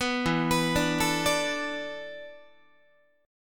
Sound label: E 7th Suspended 4th, Guitar; E7sus4; arpeggiated